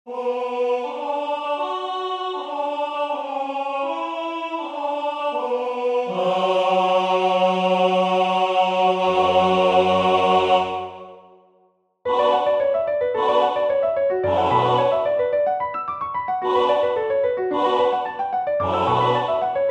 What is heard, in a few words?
vocalise satb pno